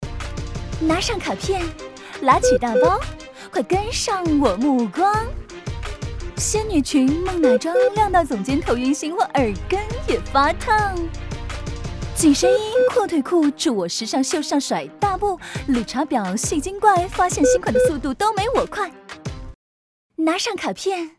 歌曲翻唱 rap说唱

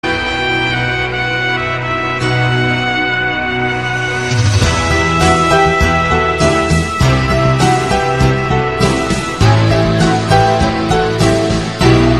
伤感的背景音乐; 3分以内

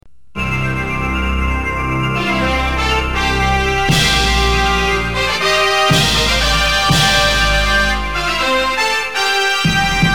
片头音乐

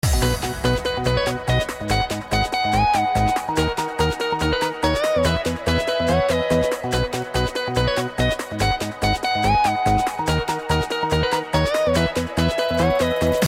片头音乐

